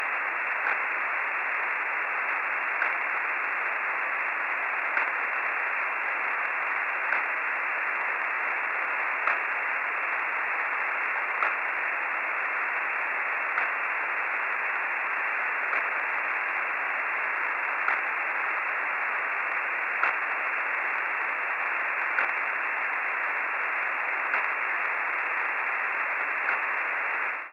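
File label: fsk, spacesounds; NUDT; 437mhz300 9k6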